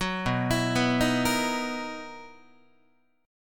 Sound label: B-Major 11th Guitar, BM11 chord; arpeggiated; JGuitar